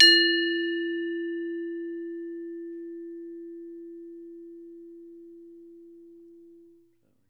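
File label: METALOPHONE, Pitched Percussion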